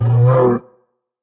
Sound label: monster roar short; soundscrate